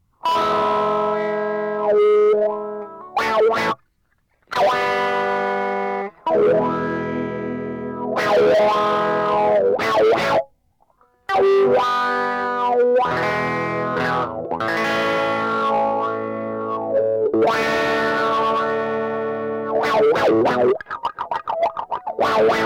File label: guitar solo